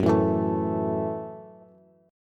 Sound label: Gb Minor 7th Gb down Bass; strummed; JGuitar